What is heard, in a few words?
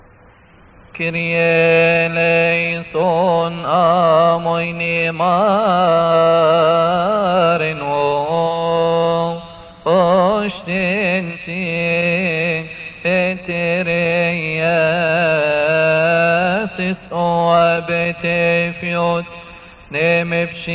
intro for verses of cymbals Coptic